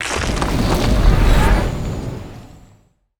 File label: necroid moving out cruiser; Stellaris; necroids